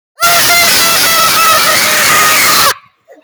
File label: golf screaming